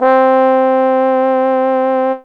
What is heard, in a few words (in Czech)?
pozoun